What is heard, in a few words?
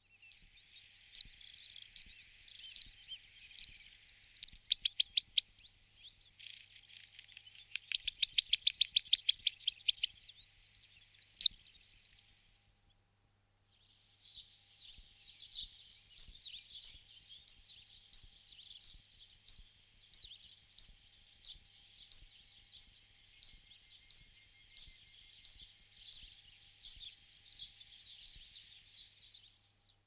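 Cisticola juncidis, passeriformes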